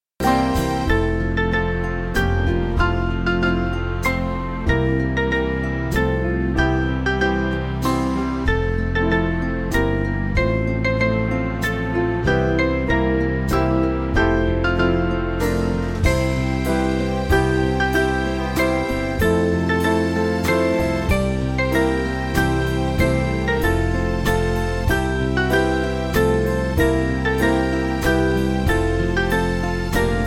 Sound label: Band, church music